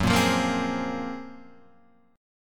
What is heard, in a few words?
F sharp Major Sharp 11th, Guitar, Standard; strummed; JGuitar